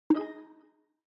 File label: plinko win